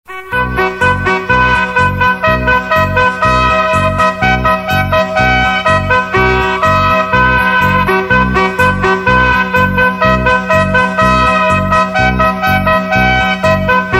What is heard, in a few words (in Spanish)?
TAMBORES Y CORNETAS